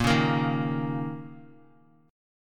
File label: Bb Minor Double Flat 5th, Guitar; x,1,1,3,3,x; strummed; 4F,4A,5C,5G,5C,6E; chord shape